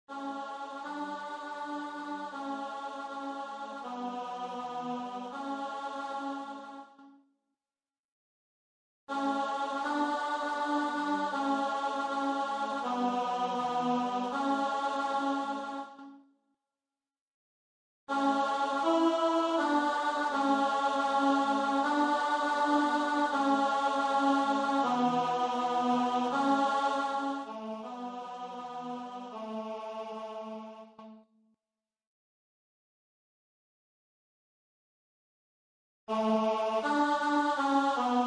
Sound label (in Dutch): tenor, kyrie; Hilber